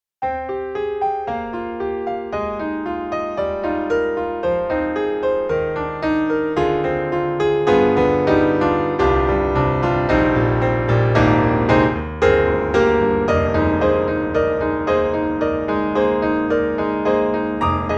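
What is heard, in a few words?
Bosendorfer 290 Close Velocity Compressed, piano; Steinberg The Grand 3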